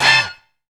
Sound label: BUSY HIT; HITS+STABS; Zero-G; Funky Elements